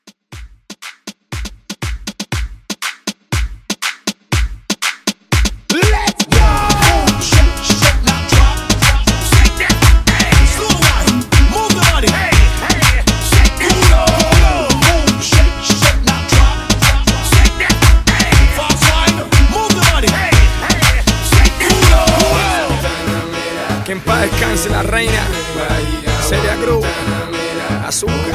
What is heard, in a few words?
Mixshow Hype Re Drum 120